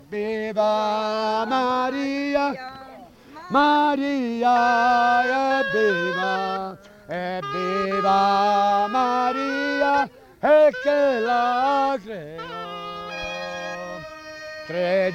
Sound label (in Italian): zampogne canto Evviva Maria, ciaramella e zampogna; fondo apolito sito alto 1981